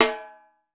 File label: repique; Definitive Percussion